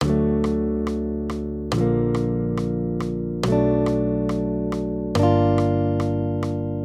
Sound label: maj triads